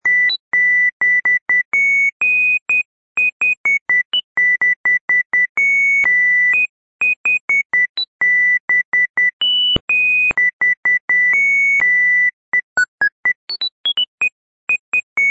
siemens; midi; Polyfonisk musik

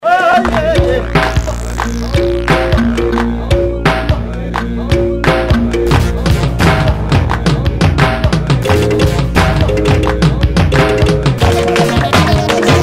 African Dragon Dance

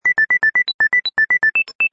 siemens; midi; Polyfonisk musik

